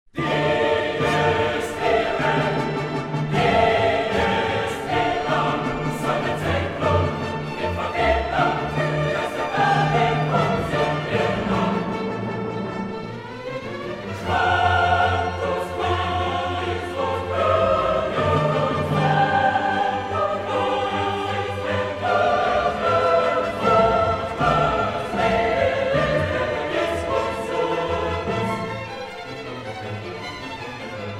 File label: Requiem in D min Sequentia Dies irae; Mozart; Missae and Requiem; Davis, Gardiner